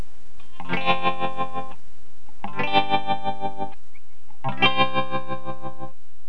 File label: Trem; TremBear